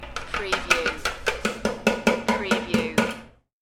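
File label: Plastic Container Bangs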